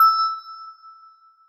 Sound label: Bell E7